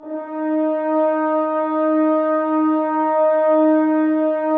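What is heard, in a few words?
Brass, French Horns; Sample CDs; Miroslav Vitous; Woodwind Brass Ensemble; F.Hrns Links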